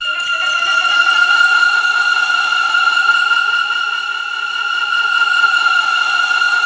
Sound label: PADS; OMNIVERSUM; Masterbits - Tekkno Trax; SYNTHFX+PADS